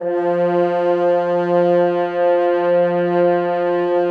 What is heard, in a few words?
French Horns, F2